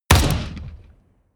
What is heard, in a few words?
shoot close